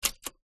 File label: office typewriter number single key press rear close vintage 1960 Lemair Helvetia